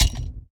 UI Click select; Puzzle Chronicles; PS3; PSP; X360

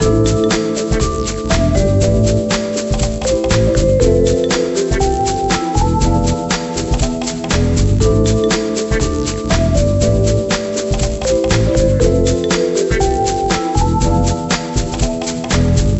Music Bed; imagine interior designer 2008 nds

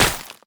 land stone; Aragami

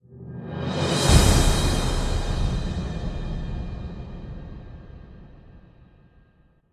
Stinger; mass effect 3 gamerip 2012; Dreadnought Stinger

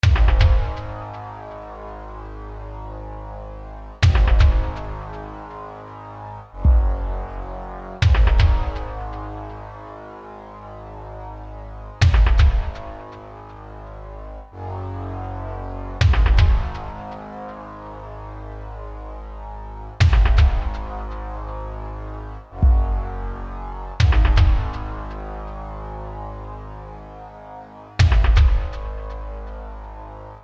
Amazon - Ambience

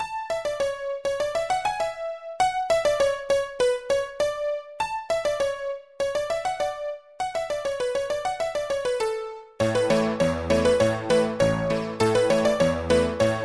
Honkytonky Sea Shanty; RuneScape 3

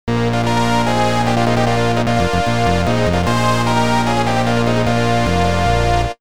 Round Start, arcade; Tower of Druaga

